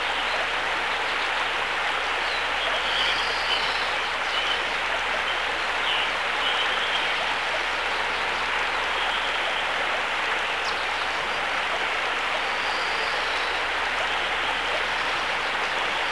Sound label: forest; firearms